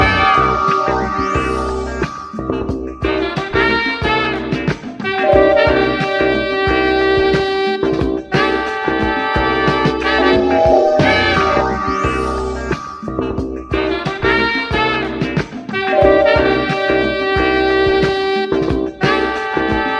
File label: horns, Loops, Phonky